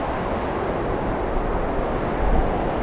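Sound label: street exterior, ambience; bespin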